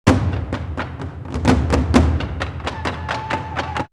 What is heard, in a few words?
Kigoma 128, drumloops World Ethnic; X-Static Goldmine